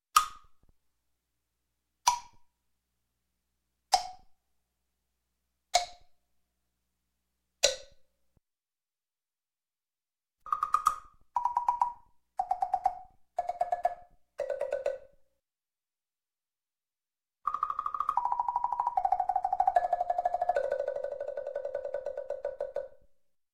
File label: Wood Blocks temple Blocks Single Hits, Music; Percussion; Sound Ideas 2000 Series